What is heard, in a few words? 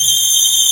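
MAJOR TRILL G4, Strings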